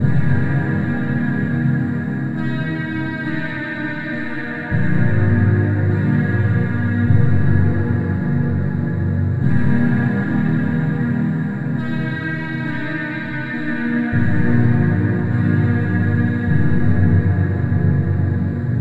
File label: mallsoft; ゆめ2っき